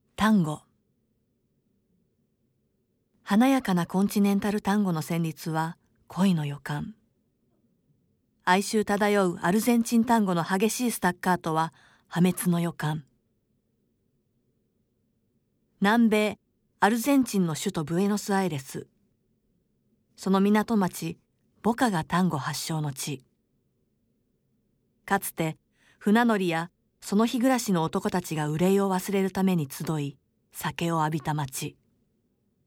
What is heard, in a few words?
TANGO